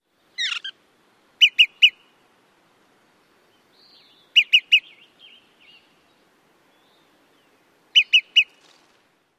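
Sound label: pit-pit-pit calls, olive-sided flycatcher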